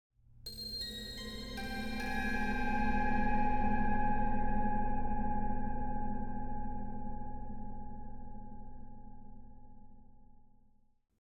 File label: Harmonic Plucks Mystic 5 Note Arpeggio; Hollywood Edge; sound designer's toolkit v2